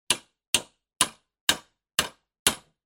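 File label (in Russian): мебельные гвозди маленький молоточек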